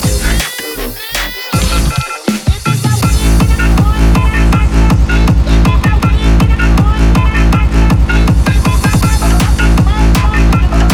Hard Techno Tekno

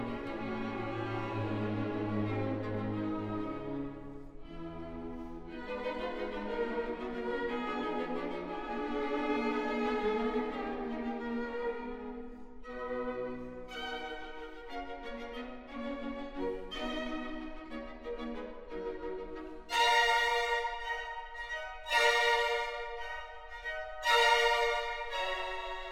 Octet for Strings; 2. Allegro Scherzeroso

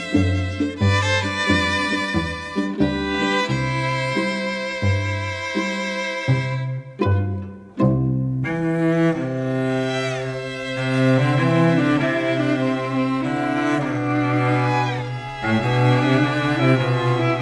String Quartet; pop

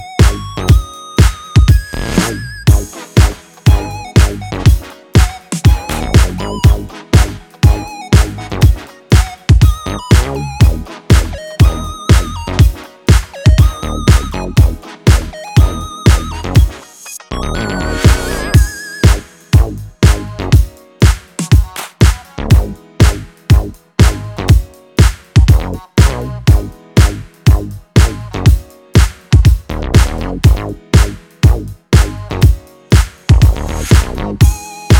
Instru Funk Beat

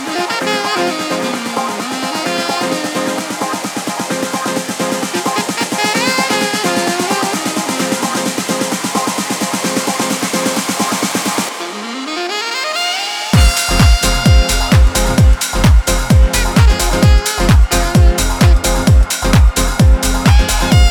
Big Sax

